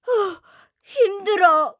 Lose Point

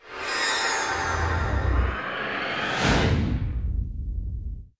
attack; Pochi

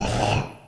voice smash; Goliath

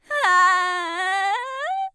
voice Cry; Shua

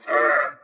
voice lob; Boonga

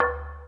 DING D4

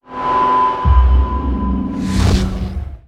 Inferno; battle item

